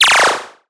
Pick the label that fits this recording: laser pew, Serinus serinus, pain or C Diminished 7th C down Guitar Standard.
laser pew